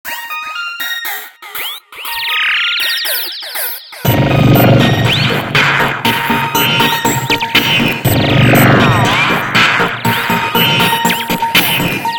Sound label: notification